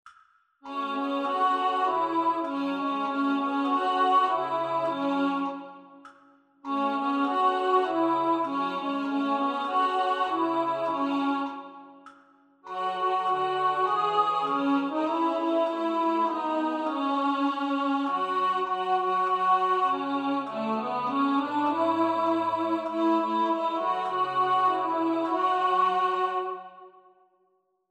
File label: Alti Voice; Martin Prend Sa Serpe